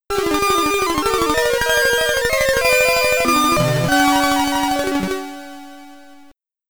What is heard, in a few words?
Game Start, SID Stereo